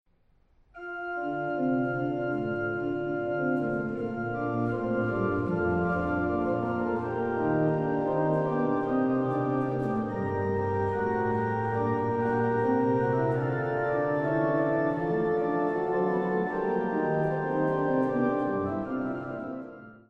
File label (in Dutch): Orgelvers; Psalm 15